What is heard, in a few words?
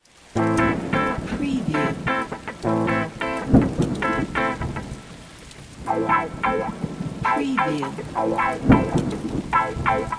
RTM Bastaki Am 105BPM, electric guitar; 6-8; beatcraftpro